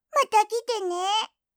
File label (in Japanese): Windowsの終了